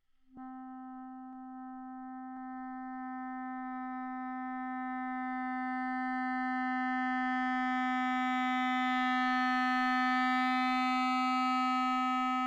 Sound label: classical clarinet c, Anechoic; OPENAIR